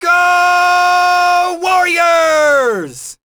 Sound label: WARRIORS, fan; NBA Inside Drive 2004